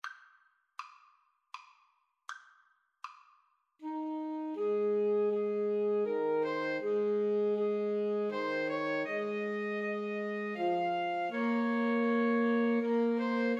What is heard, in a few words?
Away in a Manger, Alto Sax Trio